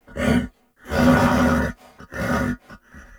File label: Horse Vocal Mount Neigh; Vehicles; Vocals; Warframe; Duviri